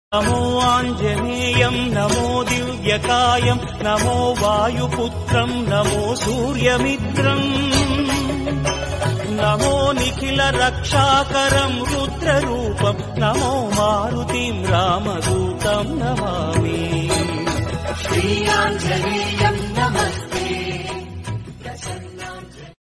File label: Hanuman ji Song